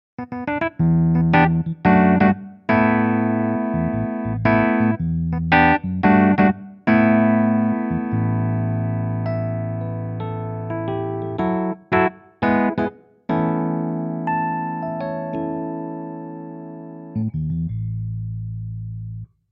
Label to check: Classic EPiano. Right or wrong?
Right